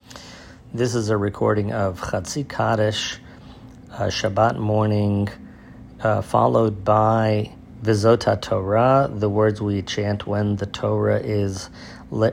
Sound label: Chatzi Kadish